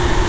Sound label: monster walking loop